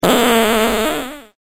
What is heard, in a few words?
fart